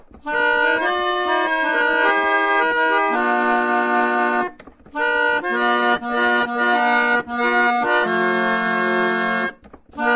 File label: sweet, Roller Organ